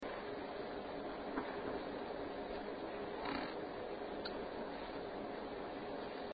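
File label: Closet Noise, paranormal evidence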